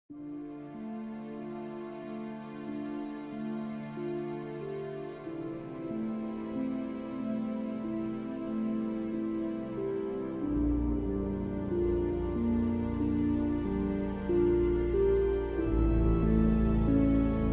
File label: Organ Theme